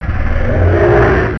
gohma idle, enemies; zelda

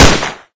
glock fire; mistake coop

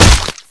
cbar hit bod, weapons; svencoop; echoes

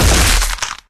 cbar hit; svencoop; gruntscourse

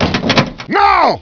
latch locked, buttons; svencoop; cracklife

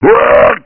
pain; svencoop; uboa; ichyhakase

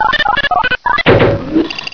mortar activate; svencoop; echoes

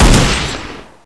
aa tank shot; svencontra2; stage1

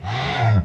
gonome moan; svencoop; lostmaze

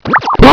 pain; svencoop; cracklife; barney

